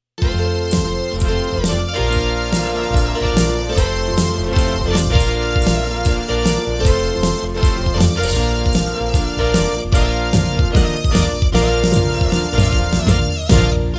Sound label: drums